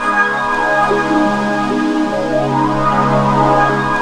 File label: VINYL ST PAD